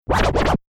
Turntable Scratching

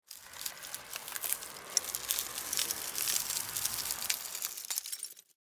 Science Fiction Ice Freeze Super Power Long; Battle (Sound Effects:Science Fiction)

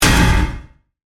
Hit Metal Impact, Reverb; Production Element, Imaging Element, Accent, Transition

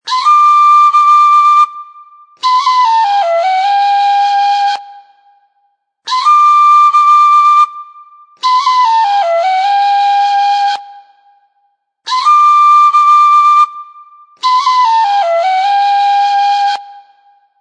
Tin Flute; TinFlute 3 Ringtone; Music Ringtone

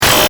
Hit Distortion Beep; Production Element; Sound Design

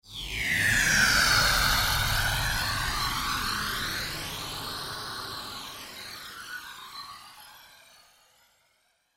Sci Fi Spaceship; Sci Fi Spaceship Whoosh; Spacecrafts

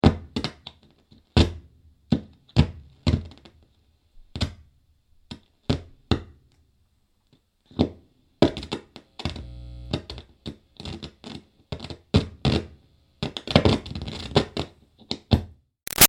Static Guitar Plug In-Out Dirty; Interference; Buzzes; Musical; Guitars; BigRoomSound

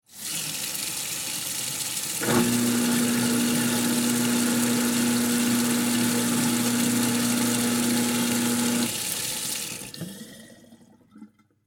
Household Garbage Disposal Water On Run Off; SFX Bible

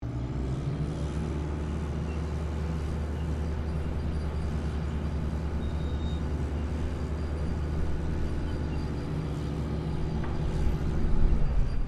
Construction Pile Driver Setup Manoeuvring Close; Construction site pile driver operating

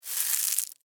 Impact Leaves Crunch, Single; Crunches; Objects; BlastWave

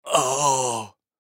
Human Grunt; Grunt Ohh Element Male 25 Yrs; Blastwave

